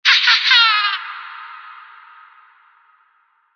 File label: evil laughter real; Halloween